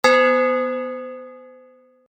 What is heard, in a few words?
plate source, Metal plate source sound; Spectralism and Microsound